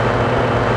Fan med

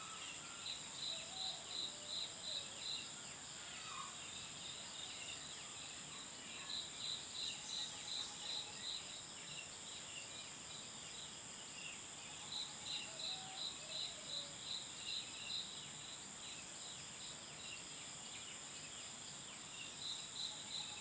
Amb Base Morning; viewfinder

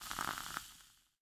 Death; Folk Hero

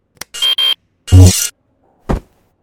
Laser cut

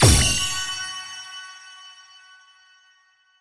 Thunder Spell; Dark Magus Thunder Spell5

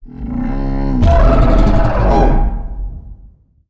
King Frong Croak

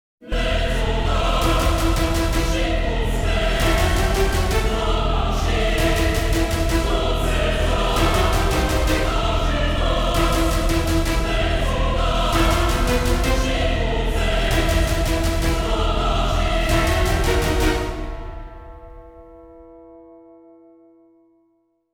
Music Phase 3 Part 3; Azrael

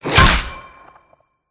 Sfx Baseball

